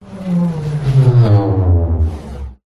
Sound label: whoosh; Audio Mangler